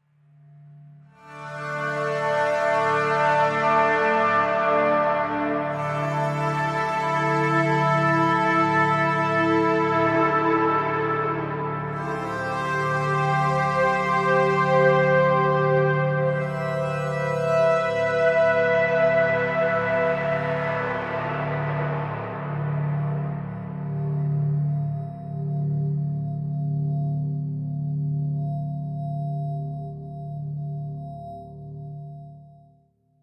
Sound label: AMB Guitar